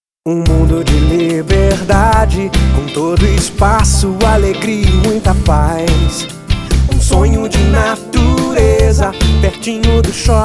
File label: JINGLE